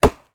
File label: mining wood; Factorio